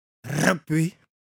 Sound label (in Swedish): bip; wolof